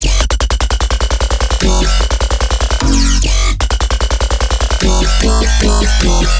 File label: Bass Loop F#, Dubstep, 150bpm; Android; Ghosthack; Dubstep Sound Arsenal